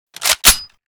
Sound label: sks unjam, weapons; Blindsides Reanimation Pack